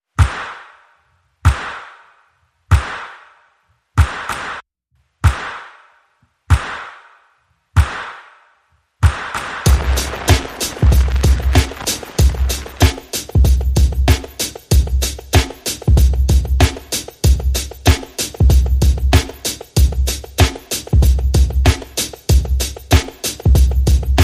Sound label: Percussion Only